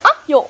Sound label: ah